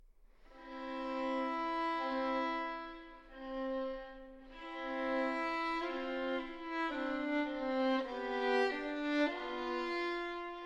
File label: violin sonata in a minor andante; violin sonata no. 2; bwv 1003 iii